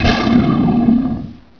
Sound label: launch; acdemo; AC Demo 3-14-98 RipCord